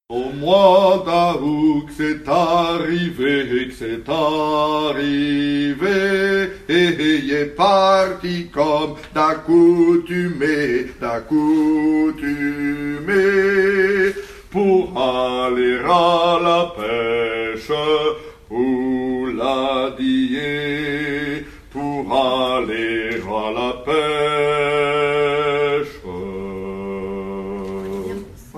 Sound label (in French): Complainte du Neye basse